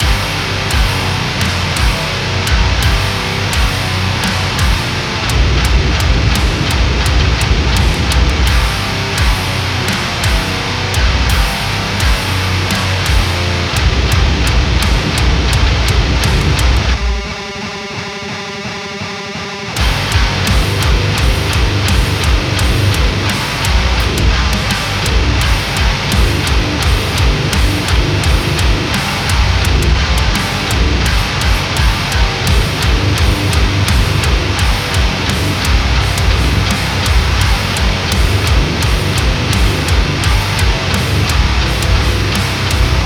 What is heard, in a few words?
Down Tuned Metal